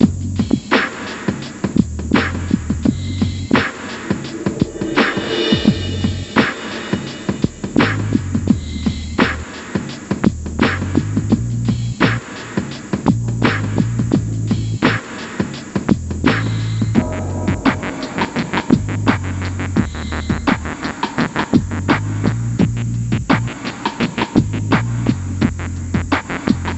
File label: Perc; Nancy Drew: Stay Tuned for Danger